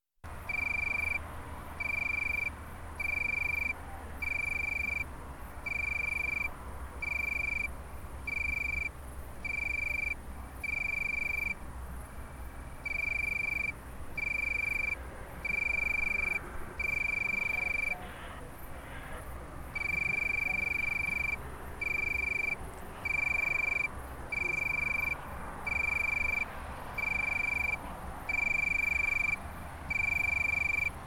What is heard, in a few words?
Tree Cricket